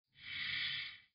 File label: cars pass